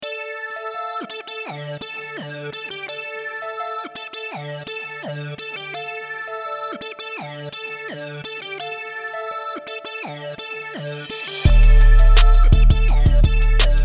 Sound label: chord skeleton alt